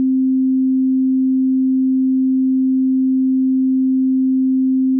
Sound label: sine; Oculus